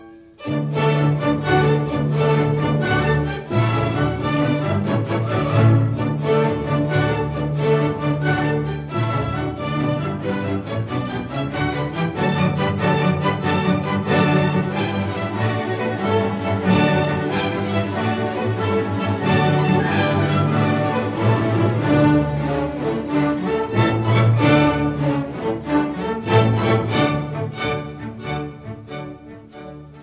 Violin Concerto in D major Op 61 III Rondo Allegro; Beethoven; Jascha Heifetz